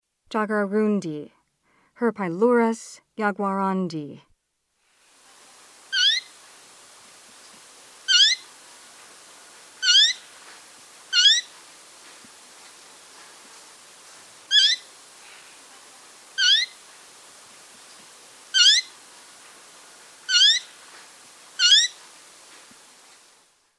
Jaguarundi, Mammals of the Rainforest; S. America

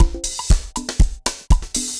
dancefloor perc 120 bpm

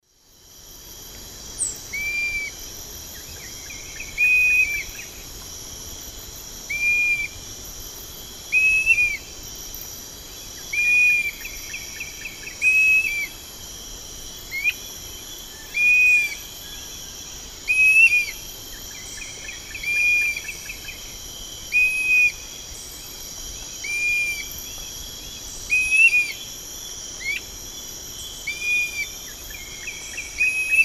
Myiarchus tuberculifer, dawn song; S. America; Eastern Lowlands

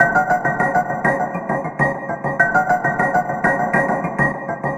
Gel Riff, Sequences; Electronica

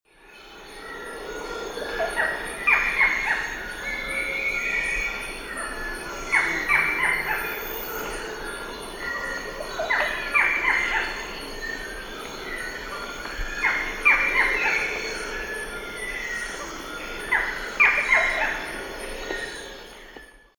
Micrastur ruficollis, 4-noted song; S. America; Eastern Lowlands

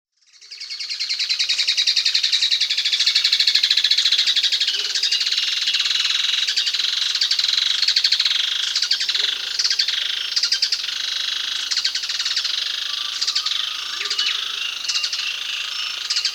Plain Softtail (Thripophaga fusciceps), Peru; S. America

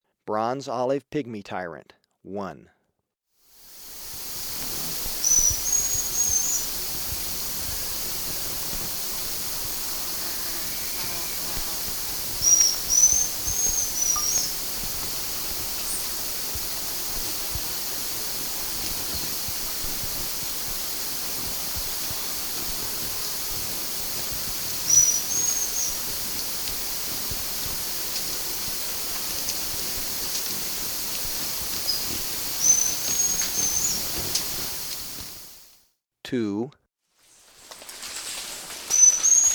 Bronze-olive Pygmy-Tyrant; S. America

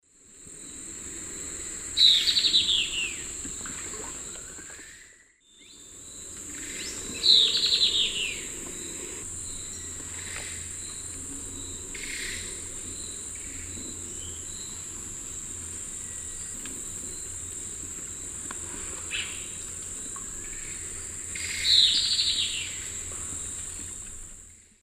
Myiopagis flavivertex, call; S. America; Eastern Lowlands

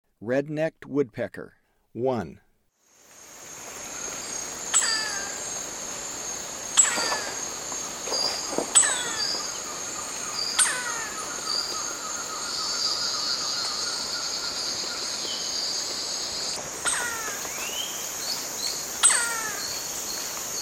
Red-necked Woodpecker; S. America; Eastern Lowlands

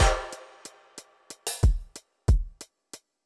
deep beat 92

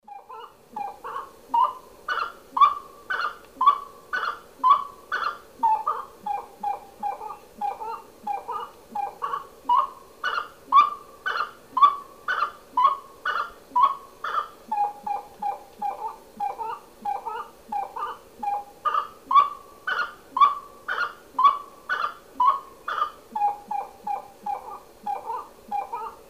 Aramides cajanea, duet; S. America; Eastern Lowlands

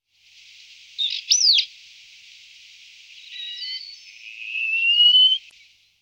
Tichodroma muraria; All the bird songs of Britain and Europe; Jean-Claude Roche

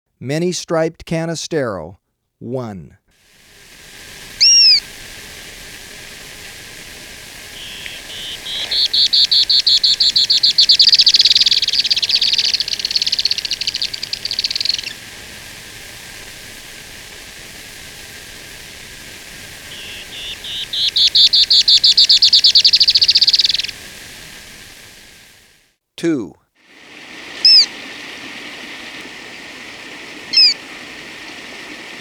Many-Striped Canastero, Birds of Ecuador; S. America

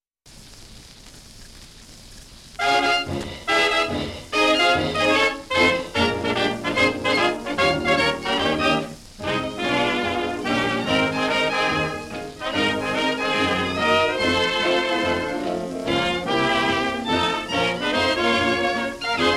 Orchestra; Popular Jazz Archive 1920-1936

